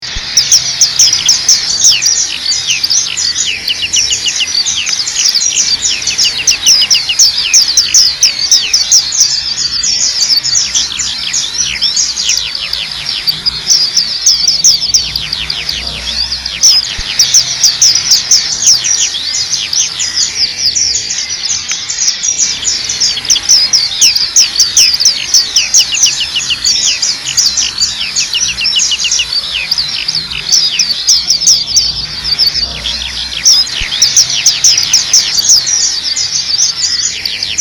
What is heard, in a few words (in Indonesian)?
suara burung pleci rombongan; omkicau